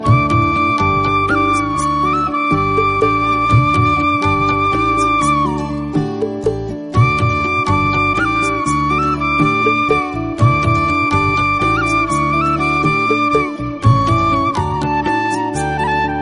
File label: Flute BGM Ringtone